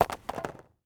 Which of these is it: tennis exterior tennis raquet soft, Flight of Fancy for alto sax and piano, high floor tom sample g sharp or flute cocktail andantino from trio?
tennis exterior tennis raquet soft